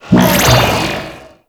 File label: Survival Artifact Charge; Warframe; Missions